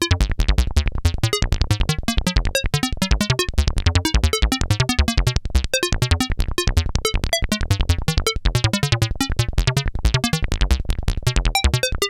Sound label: RANDOM SEQ; TECHNO-BOX